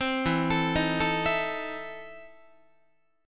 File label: E Major 7th Suspended 4th Sharp 5th, Guitar; EM7sus4#5 arpeggiated